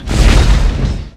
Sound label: stomp; gmod; lvs star wars; atte